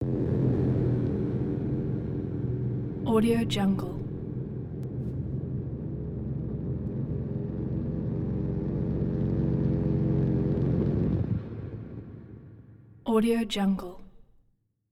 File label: tension warbley burning tone; soundmorph